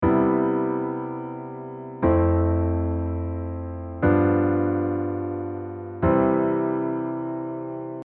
chord cadence

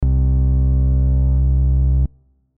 RnB bass 3 fx

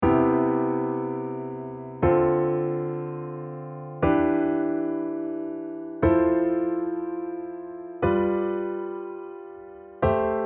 diatonic LH R7

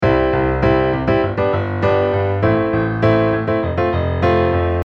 shake beat

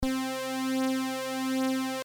Jump Brs vcf

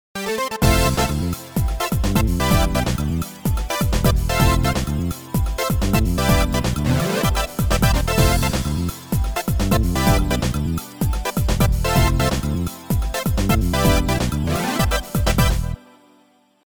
Funk Brs

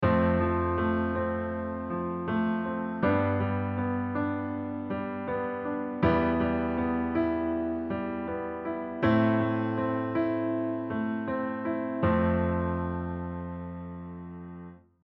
comping

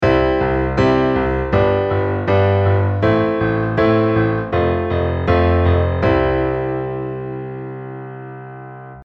8 beat

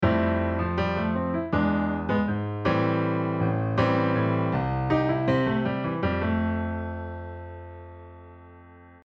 oshare rhythm

